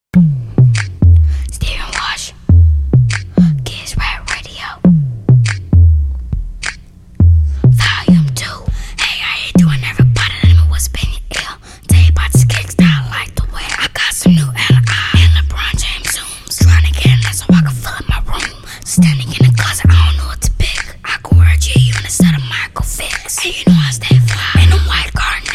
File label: hip hop ringtone